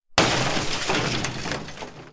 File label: glass windows breaking